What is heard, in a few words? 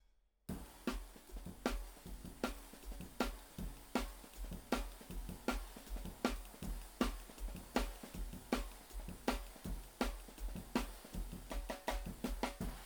OH HH, DRUM N BASS:JUNGLE BEATS; THE RAIN RIDE CYMBAL; RAW MULTITRACKS